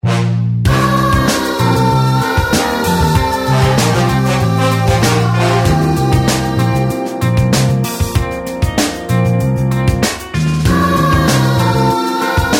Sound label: game epilogue; garryware